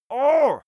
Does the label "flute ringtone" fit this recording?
No